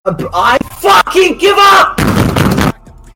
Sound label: rage